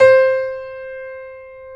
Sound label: PNO, Piano; AKAI S6000 CD-ROM - Volume 1; CS PIANO